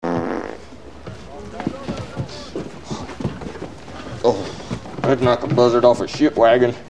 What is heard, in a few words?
fart